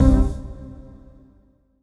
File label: HOUSE, House Dance